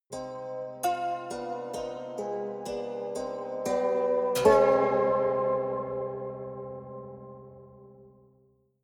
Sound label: haunted strings quiet sequence